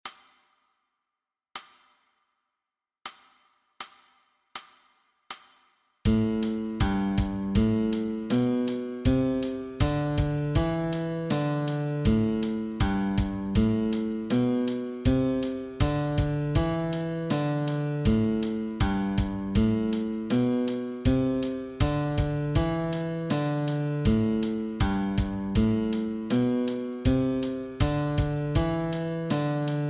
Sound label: Guitar Exercise